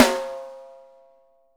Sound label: WOOD, Living Drums